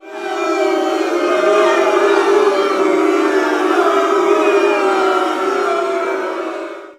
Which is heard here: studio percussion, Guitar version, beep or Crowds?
Crowds